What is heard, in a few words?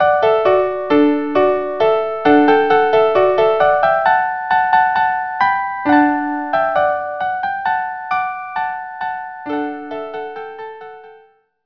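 violin unaccompanied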